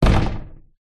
household door glass sliding conservatory thump fist